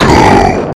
Monster Go

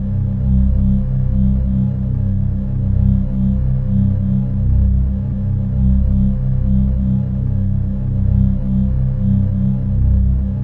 Laboratory Loop

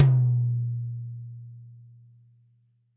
Tom

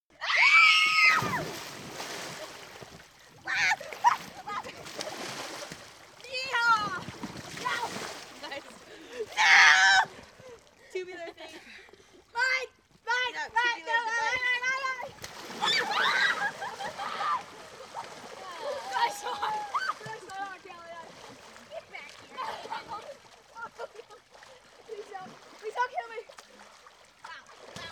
Pool Summer Sounds Kids Frolicking

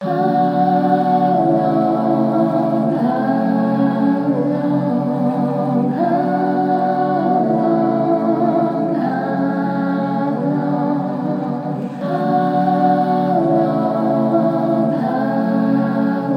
Choir

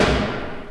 Snare Hit

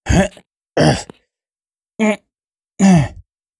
Grunting Effort Pushing Something

